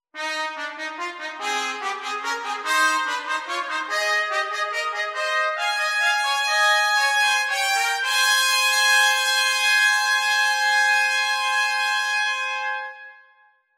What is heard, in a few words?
Fanfare; McM Centennial